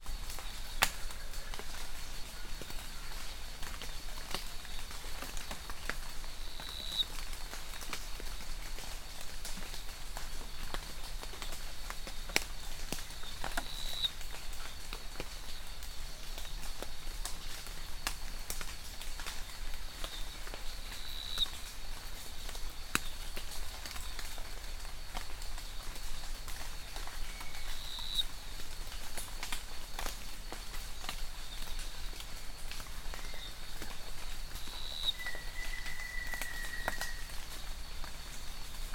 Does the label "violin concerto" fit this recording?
No